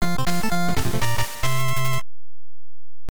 Call Jingle; The Jetsons Cogswell's Caper; NES

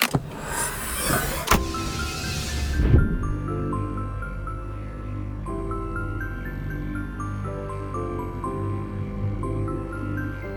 gimmick berth pod music; Spacebase Startopia

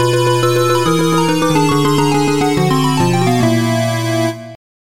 Fail Music, soundtrack; Tron; arcade

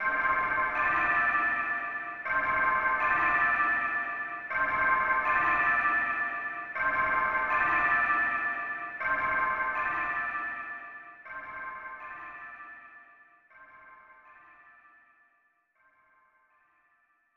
Wedding Bells, soundtrack; traverse starlight and prairie; snes

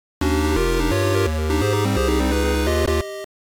Level Complete; pac attack dreamcast

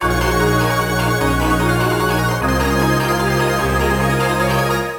BGM STAGE BATTLE; Phantasy Star Zero

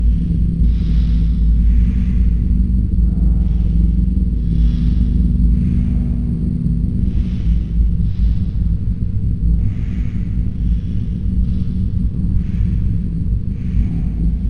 Menu Music Drone; spookware

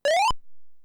PC Speaker Yippee!; Lemmings; Tandy

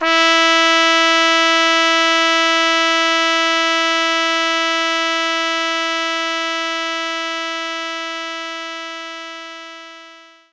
Trumpet E4

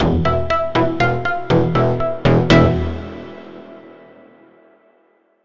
Mood Tension; house m.d.; nds

